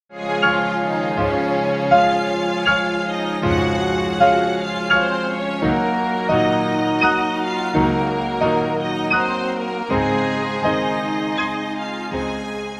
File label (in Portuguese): concerto piano orquestra